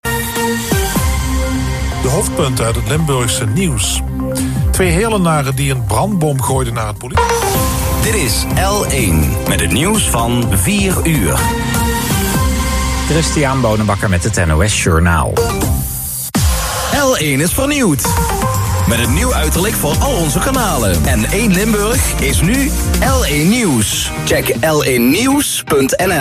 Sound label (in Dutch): L1 Radio audiovormgeving; L1 Limburg; nieuwe huisstijl en vormgeving